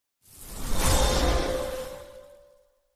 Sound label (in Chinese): 换边音效; xitongyinxiao